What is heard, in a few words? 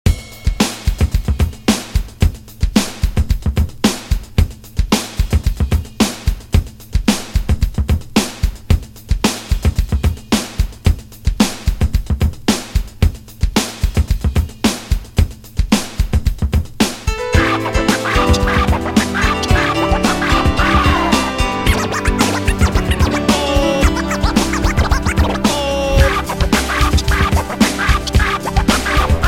Scratch 112Bpm